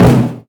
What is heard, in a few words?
low mid tome drum sample b key